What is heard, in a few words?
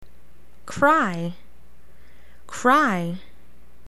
cry